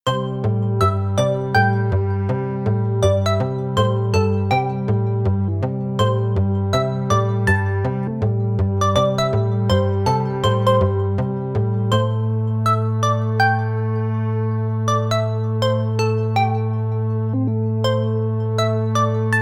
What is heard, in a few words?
lfo keys harmonious